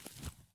soil jump; Escape from Tarkov

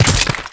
connect flesh

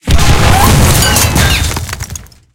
tank hit he big; tanks; War Thunder

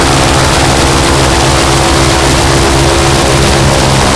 bearcat side, engines; War Thunder

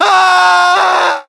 death fall; english; voiceovers; falls

